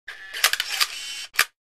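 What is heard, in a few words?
35mm Camera Single Shutter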